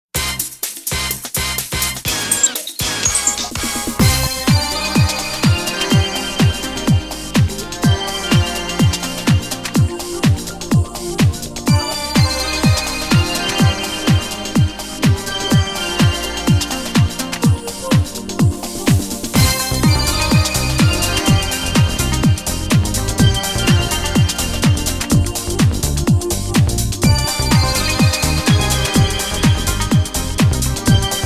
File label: Bird & Rain; Farhad Besharati